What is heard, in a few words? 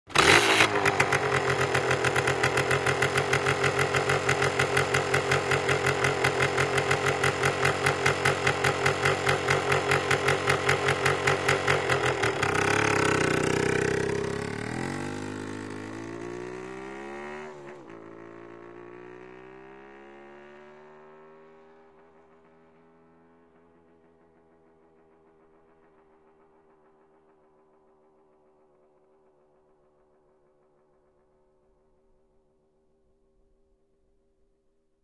Motorcycle starts, waits, drives away